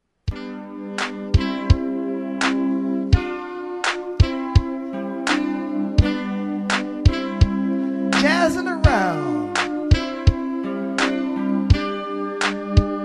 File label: jazz